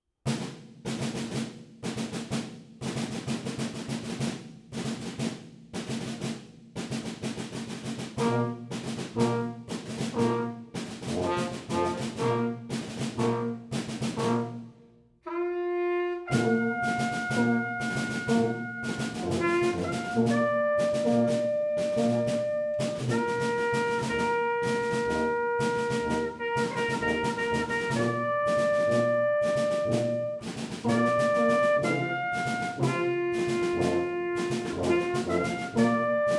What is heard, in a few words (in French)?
Bolero militaire; sentier musical au val dajol